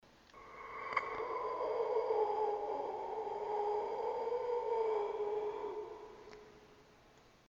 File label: angry; csgo; eternity; scp; 966